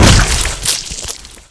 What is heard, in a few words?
gibbed